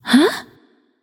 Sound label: confused light; smite; voicepack; hel